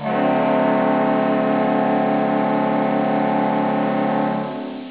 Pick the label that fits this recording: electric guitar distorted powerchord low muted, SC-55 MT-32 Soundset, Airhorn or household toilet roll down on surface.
Airhorn